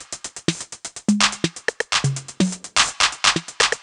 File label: drum loop chit top 125, Classic Chicago House; Sample Magic; Drum Loops